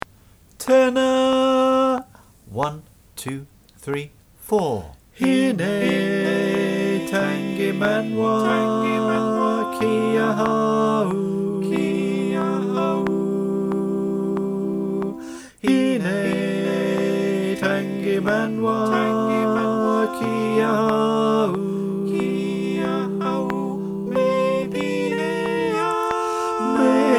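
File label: click-track, tenor, choir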